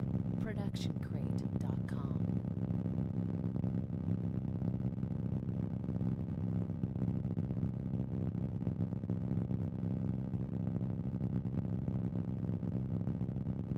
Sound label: plane propellers; soundscrate